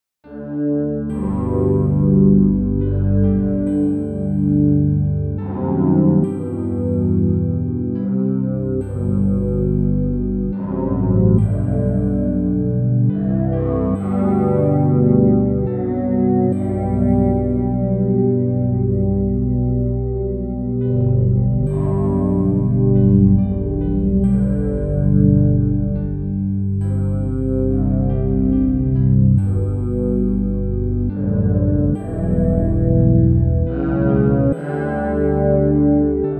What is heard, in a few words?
amazing grace backing in g